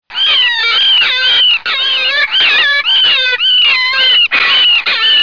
rabbit distress

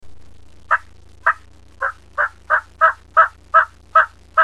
Turkey Hen Yelp

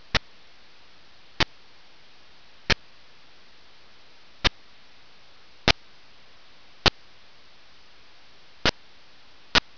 turkey cluck

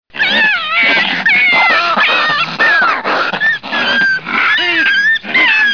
foxes fighting

